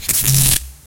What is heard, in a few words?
a sharp sudden sound used, cloth